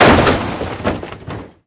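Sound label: physics suburban crash impact distant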